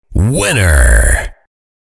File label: Winner sound effect